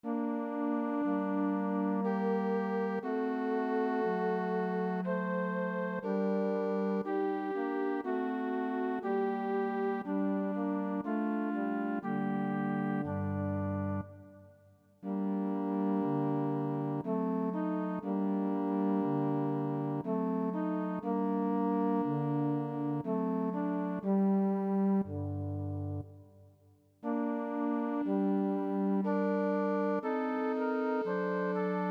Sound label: chorus flute